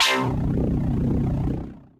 Square Pw BP, Synth Lead; Ableton; Factory Packs; Punch and Tilt

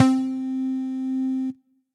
Night Out, Synth Lead; Ableton; Factory Packs; Beat Tools

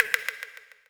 glitch reverb; kidGlitch; reverbnut; Ableton Foundation FreePacks; Wicked Kits

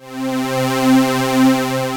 106 Synth Pad; Ableton; Factory Packs; Synth Essentials

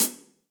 Hihat Closed Big Punchy; Drum Hits; Standard Hits; Ableton; Factory Packs; Session Drums Club

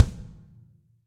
Kick Plastic Low Bright Room; Drums; Drum Hits; Standard Hits; Ableton; Session Drums Studio

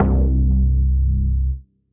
Digi Bass; Instruments; Brooding Bass; Ableton; Mood Reel

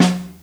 SN Mono, Snare; Ableton Foundation FreePacks; Snare Designer

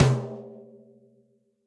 Tom Mid Room With A Vista, Drum Hits; Standard Hits; Ableton; Factory Packs; Session Drums Studio

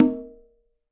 Conga C Open; Latin Percussion; Drums; Drum Hits; Ableton; Factory Packs

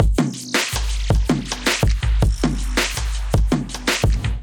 Groove 108 bpm, Drums; MIDI Clips; Fly Situation; Ableton; Chop and Swing

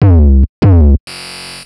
145 NuMetal Noise Loop; Percussion; Rock Hard

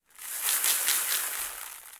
Caxixi FX; Shaker

